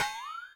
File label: ride, Millenium MPS-400